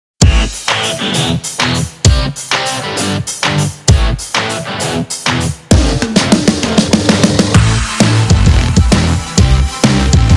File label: ENERGETIC ROCK POP